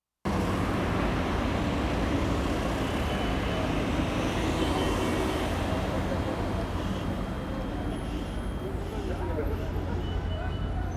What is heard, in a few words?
dance song